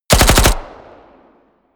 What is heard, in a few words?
smg uzi 45 heavy burst